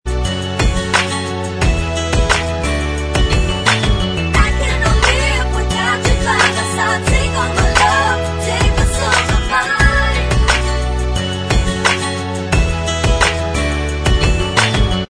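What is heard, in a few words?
instrumental, backing tracks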